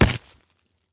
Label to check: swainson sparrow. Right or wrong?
Wrong